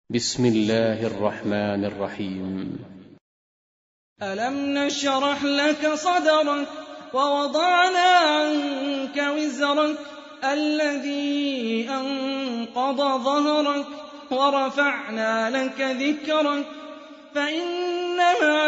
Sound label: Quran; Hani Refaey